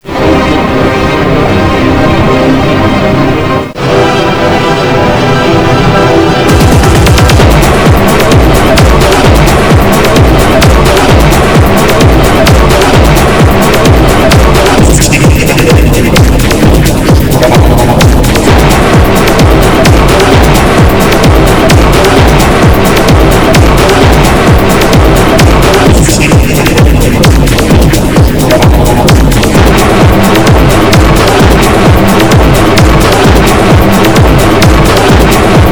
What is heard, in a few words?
psychedelic trance